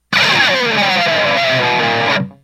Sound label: guitar scrape